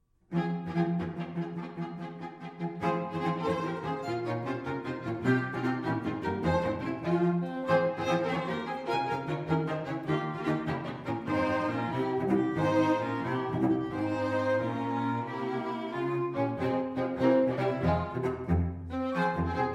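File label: string quartet; jadassohn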